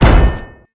impact metal heavy

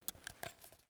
food can put away; Escape from Tarkov

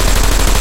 loop vz37 origin; guns tanks

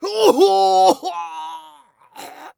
death; Escape from Tarkov; scav4

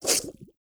bullet flyby underwater; Rust

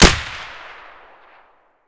mosin outdoor silenced close; Escape from Tarkov

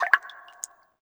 city occasional

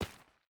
footstep burlap stones run; Rust

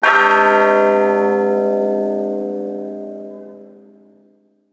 bell; World of Tanks Blitz

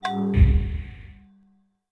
Combat; Civ4 warlords

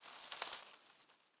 leaf rustle twiggy med

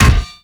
arm airpunch

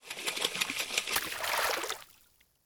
ambient; World of Tanks

